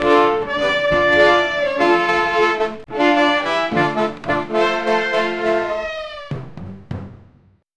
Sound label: a creative 5 seconds musi, parade